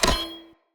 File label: Cleaver Throw Catch, Swords; Weapons; Player; Bromius Cleaver